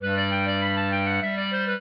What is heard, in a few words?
trio, clarinet; Mozart